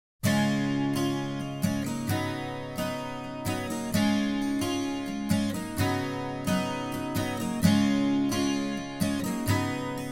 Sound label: Acoustic Guitar; Acoustic Guitar New Sound